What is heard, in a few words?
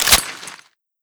auto shotgun bolt back, weapons; eva8